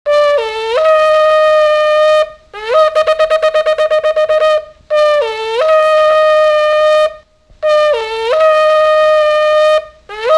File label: shofar sound